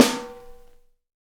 trebly acoustic snare C key, acoustic snare sample tuned to the C note. Loudest frequency: 1099Hz